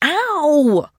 trader pain; 商人杰恩（七日杀）

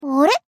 tap

